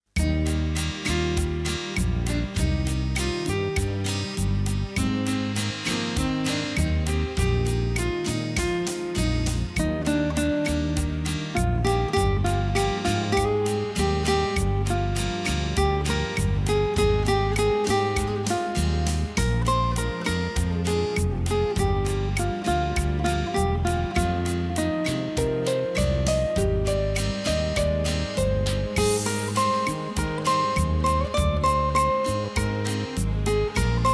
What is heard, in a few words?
instrumental